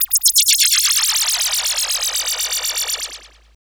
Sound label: FX SWEEP